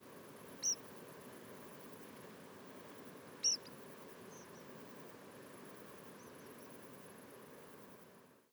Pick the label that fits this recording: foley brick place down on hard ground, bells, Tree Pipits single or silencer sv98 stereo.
Tree Pipits single